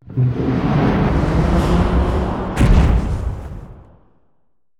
manticore death, monster

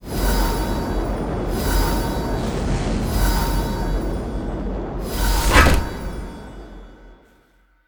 Boss Ancient Guardian red, monster

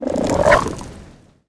crag toad attack

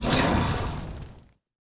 dragonboss damgup, monster

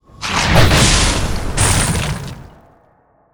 reaperboss, monster

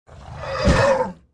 bugmander attack, monster

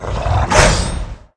orc attack, monster

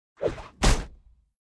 item box

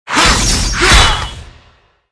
dark priest attack

